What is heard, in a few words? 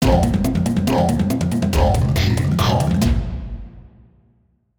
Collect Golden Banana; Donkey Kong 64